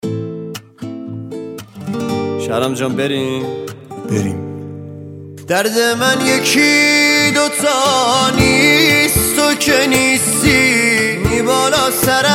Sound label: Guitar Version